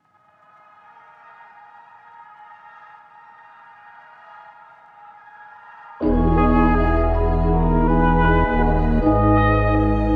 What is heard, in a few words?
160 BPM G# Min, Melody; Cymatics; 2022 Melody Collection Platinum Expansion